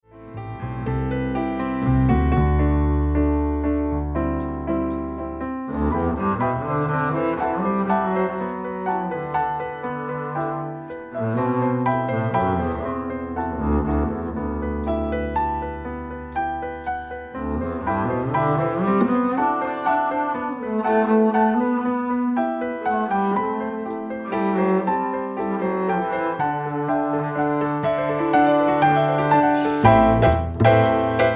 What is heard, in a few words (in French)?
piano contrebasse